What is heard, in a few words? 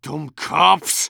Medic negative vocalization